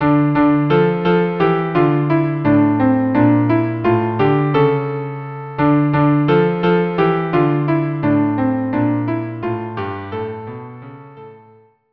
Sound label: violin cello; God rest ye